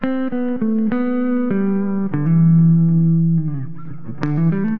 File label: 100 cool guitar; GUITAR LOOPS